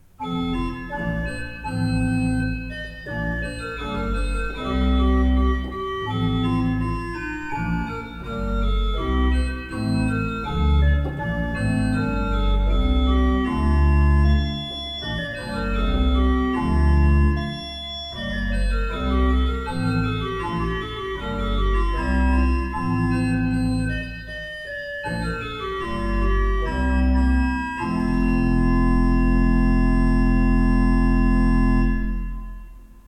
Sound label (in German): Breil, gebrauchtorgel